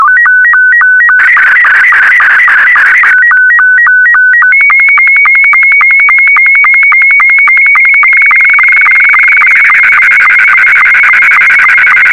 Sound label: sstv